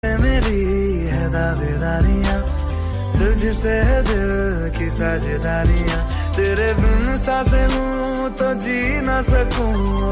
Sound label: heart touch sad ringtone